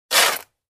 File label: dig shovel into sand pile